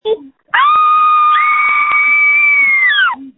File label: screams